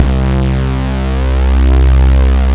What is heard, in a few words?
brass C2